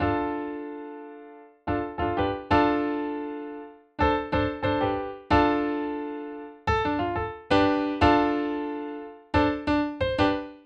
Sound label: Piano Grapevine vamp